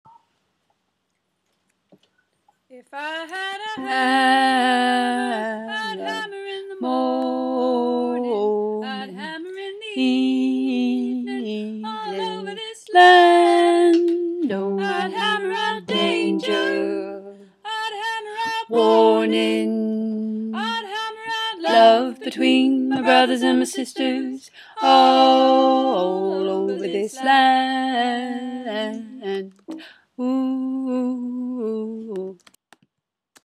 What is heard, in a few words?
lower accompaniment